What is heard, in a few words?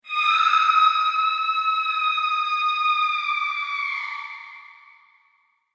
Scream Human Female; Screaming Sound Effects